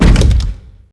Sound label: Elevator Doors close; Max Payne